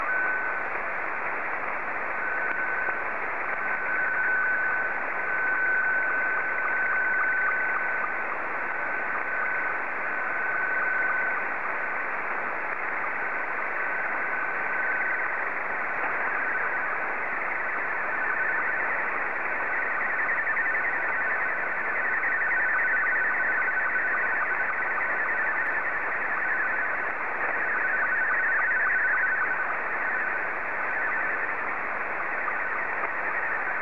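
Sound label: SSTV, Scottie 2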